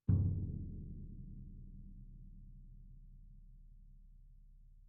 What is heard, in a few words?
bass drum p; Struck Membranophones; Bass Drum 3